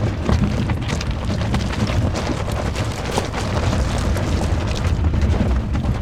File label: Auto Tires Rock Dirt Loop; Automobiles